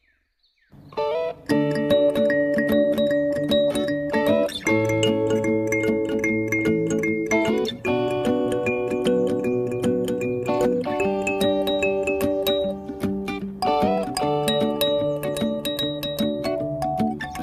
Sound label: Kalimba cover